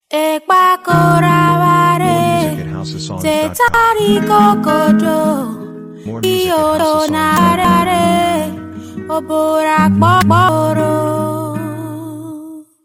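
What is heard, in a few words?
Instrumental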